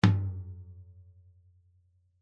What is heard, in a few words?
tom; 808